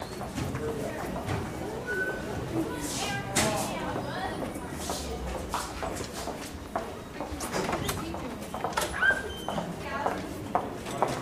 School Hall Light Wal, hallways

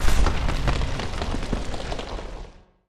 STONE DEBRIS, earthquakes

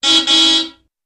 Old Car Horns Single; Various Old Car Horns - Singles & Doubles - 8 Types ( Beeps, Honks, 'aaooga', Etc... )

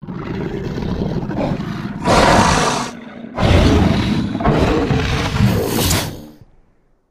Monster Action 4

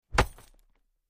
Chain Metal Hits

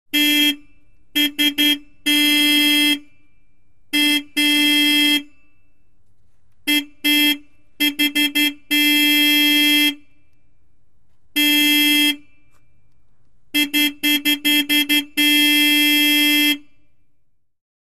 Humvee Horn Honking

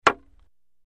Wood Staff Hits Mediu, spears & staffs

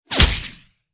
Bullet Whiz Wet Body I

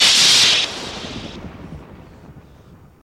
Underwater Laser FX, Sharp & Thin

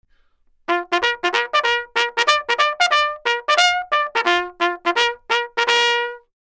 Bugle Plays Assembly; bugle civil war

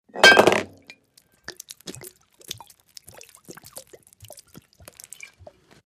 Beer Bottle Fall Over; eating & drinking

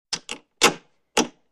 Metal Latch Clicks 4

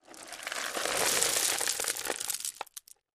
Landscape Rock, rocks & dirt

